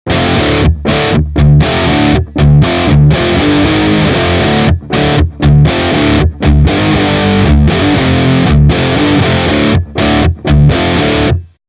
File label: COYOTE High Gain; TEYE